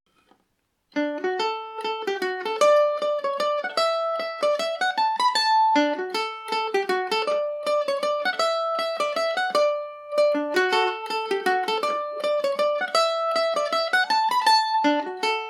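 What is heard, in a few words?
fowleys mazurka revisited D major, mandolin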